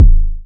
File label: Vermona Kick